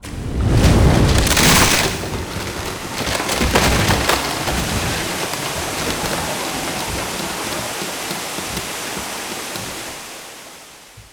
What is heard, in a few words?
Boat Sink; Chronicles of Narnia Prince Caspian